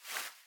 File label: Idle Wipe Off; Smite; Susano